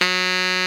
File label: TENOR MF F#3, Saxophones; Greytsounds; Philip Wolfe David Crozier's Saxophones